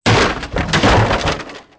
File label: barrels; Soldier Of Fortune II Double Helix; XBOX